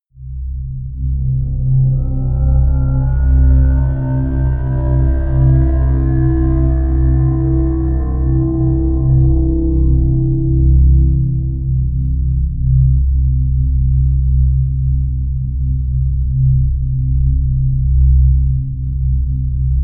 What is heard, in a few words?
drone hummstretcher